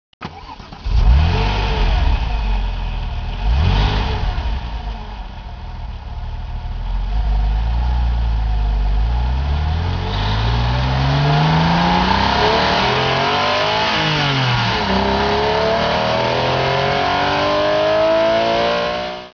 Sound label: GT3, Porsche, Accélération, A bord